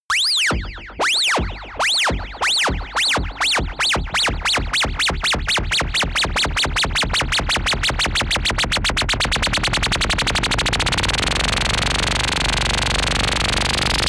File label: kick-start ufo